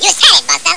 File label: Feedback; ABE Responsive Text Demo